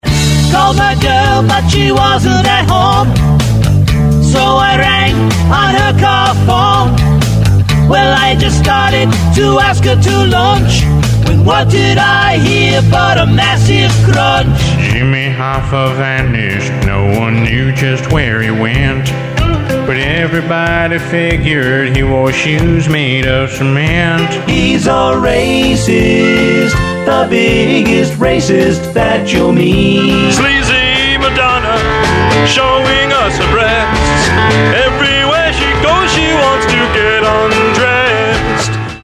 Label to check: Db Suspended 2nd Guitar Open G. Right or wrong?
Wrong